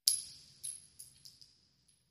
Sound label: Shell Casings Shell C, shell casing movement